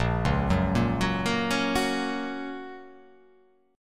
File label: A sharp Minor Sharp 5th, down